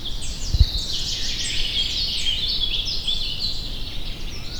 Bonte Vliegenvanger

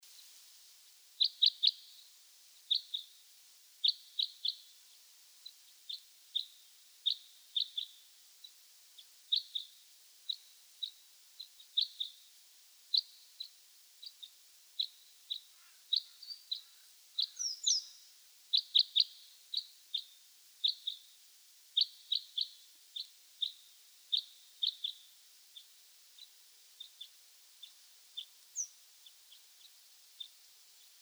Kruisbek contactroep

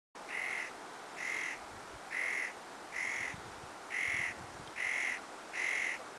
Notenkraker, trekroepjes; tijdens de vogeltrek

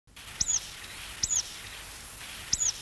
roodkeelpiep; tijdens de vogeltrek

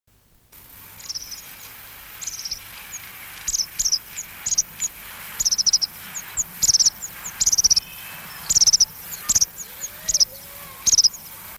eurokan, trekroepjes; tijdens de vogeltrek

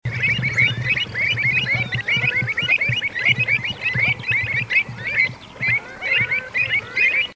Kluut, trekroepjes; tijdens de vogeltrek

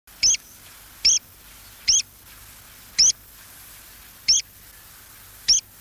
waterpiep; tijdens de vogeltrek

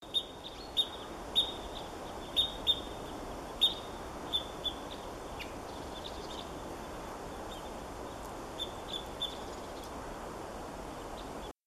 Grote Kruisbek Loxia pytyopsittacus contactroep

